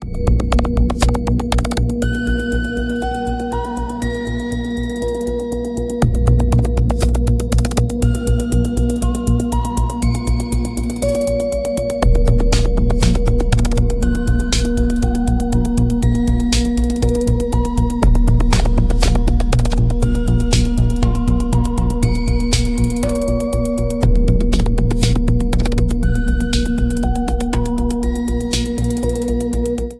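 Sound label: broken toy, Post-Apocalyptic Ambient Music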